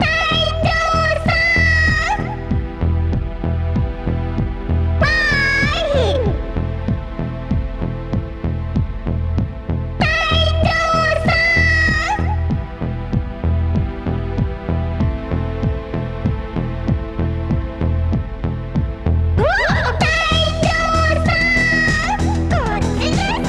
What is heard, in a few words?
synth Dhole